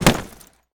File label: body impact; physics